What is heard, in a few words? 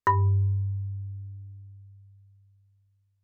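Marimba cord fortissimo G4; MIS Pitches - 2014; Percussion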